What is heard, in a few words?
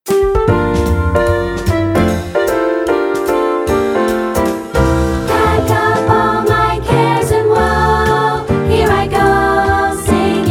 Vocals